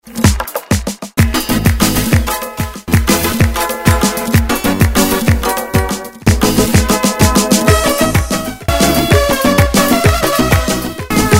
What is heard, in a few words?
string vocal; RahaMusic